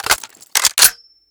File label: semiauto mag in; Weapons; Guns